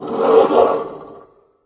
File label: sniff found, antlion guard far; hl2